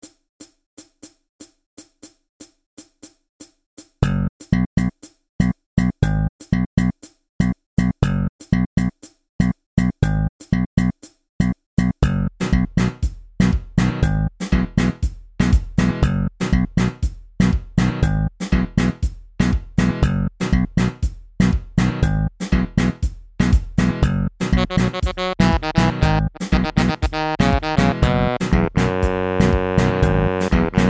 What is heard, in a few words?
MIDI Ditty